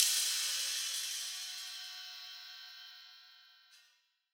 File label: crash; eddyflux; dough-samples